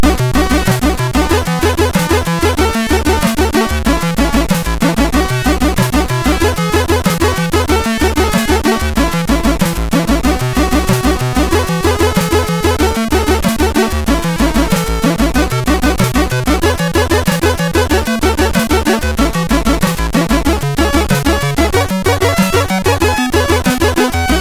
Welcome to Hell, MOS6581R3

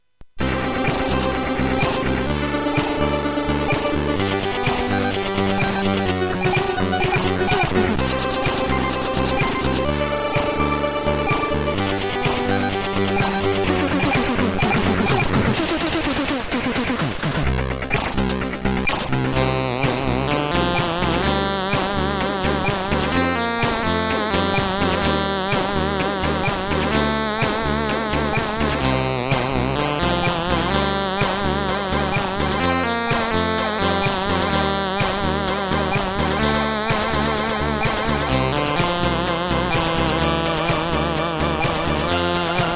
Drums intro, sid, CSG8580R5

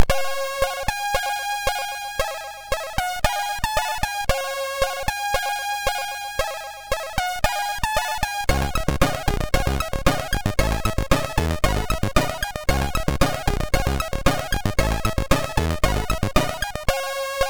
Sawtooth Only, MOS6581R3

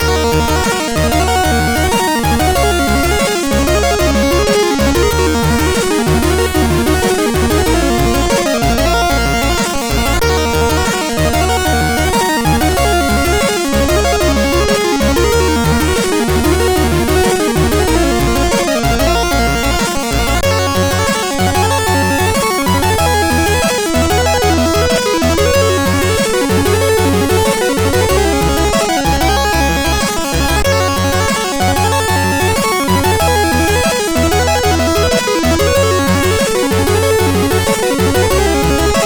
CSG8580

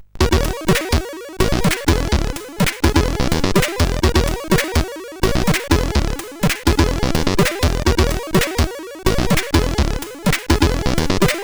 sid, CSG8580; hvsc

